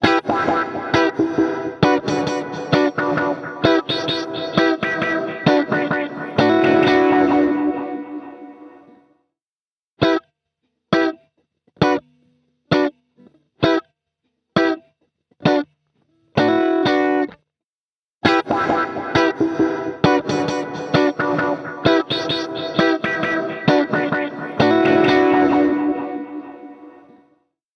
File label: Guitar; Space Island; H90